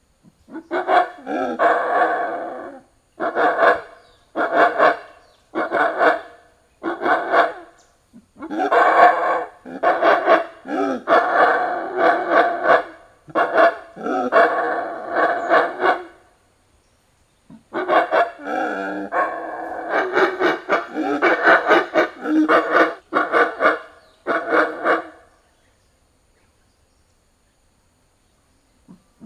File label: black howler monkey voice